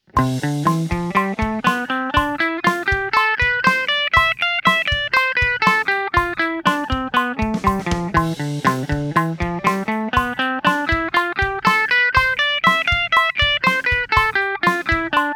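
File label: Am Ionian bpm120 eighth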